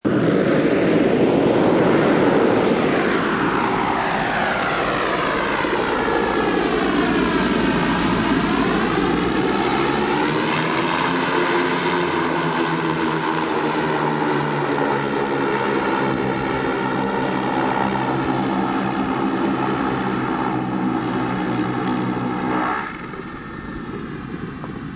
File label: twin otter